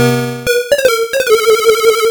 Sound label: Death; Shinnyuushain Tooru Kun SG-1000